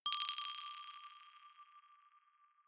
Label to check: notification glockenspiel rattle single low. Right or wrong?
Right